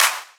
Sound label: Claps; Vengeance - Essential Clubsounds Vol.5